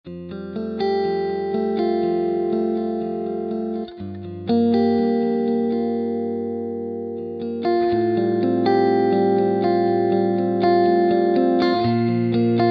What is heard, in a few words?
Arpeggio